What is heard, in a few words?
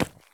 sprint asphalt; Escape from Tarkov